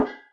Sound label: Sddark, Electric Snare, Yamaha DTXpress